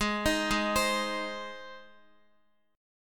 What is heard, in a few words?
Ab Major Ab down Guitar; arpeggiated; JGuitar